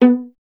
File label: viola B; Miroslav Vitous; Solo Instruments VOL-1